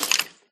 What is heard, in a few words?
step, skeleton; mob; Minecraft